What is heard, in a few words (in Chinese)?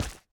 树脂放置声